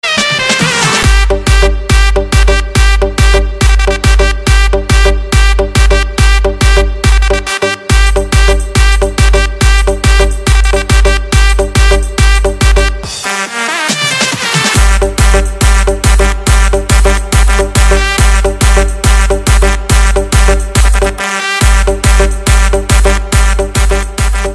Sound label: trance, ringtone; tomorrowland; teaser